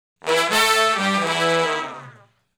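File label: Shuffle Blues Riff (E) unison falloff, Horncraft for R&B Sections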